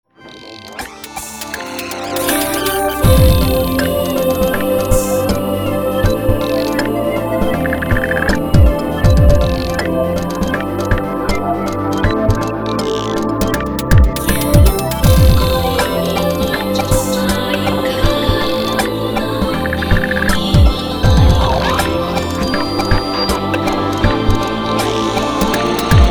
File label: Tick Tack